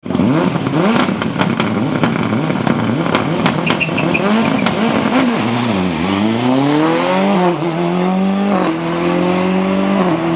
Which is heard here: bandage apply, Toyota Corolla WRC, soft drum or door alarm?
Toyota Corolla WRC